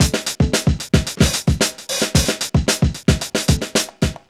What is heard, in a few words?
STREET 112, Funky Elements loops; Zero-G